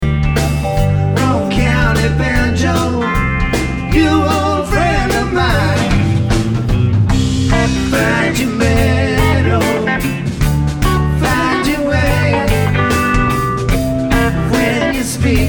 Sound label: Banjo